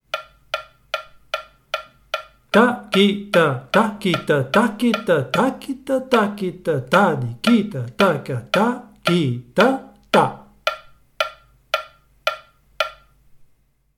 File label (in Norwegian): Udelt takt, Konnakol